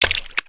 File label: paint splats; build mode